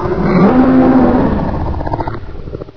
die; source engine; bshift; garg

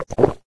barnacle gulp; Source Engine; video game; npc

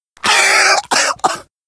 cough, survivor voice; source engine; left 4 dead 2; manager

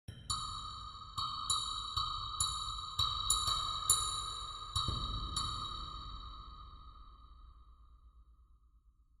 ambient synth grain click

